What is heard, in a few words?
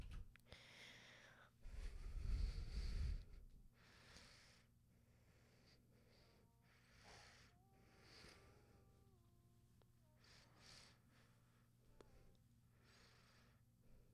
vox harmony